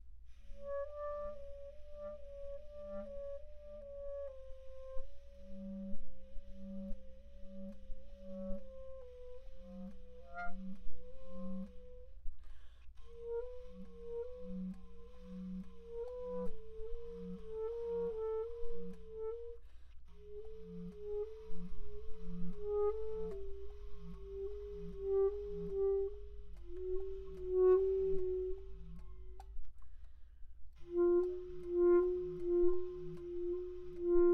bass second register key slow trills all the way down